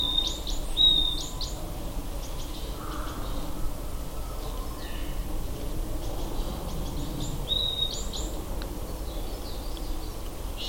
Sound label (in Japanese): yamagara; akasaka